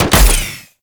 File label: Miter Shot, Weapons; Warframe; Tenno